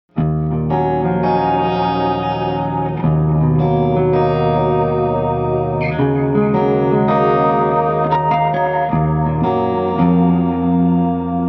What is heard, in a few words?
54 strat middle clean fx, pickups